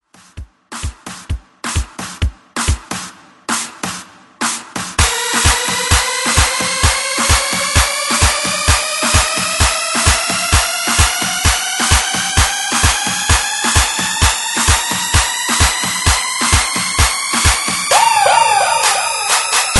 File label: 130Bpm BREAKMIX; DJDOLLS